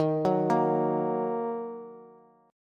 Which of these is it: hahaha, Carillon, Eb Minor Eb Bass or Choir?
Eb Minor Eb Bass